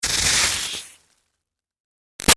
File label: Sizzle Iron Electrical Zap; Zaps; Electronics